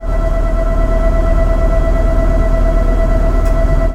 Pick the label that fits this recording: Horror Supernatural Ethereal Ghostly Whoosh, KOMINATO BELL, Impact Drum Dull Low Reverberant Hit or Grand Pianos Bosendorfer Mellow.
KOMINATO BELL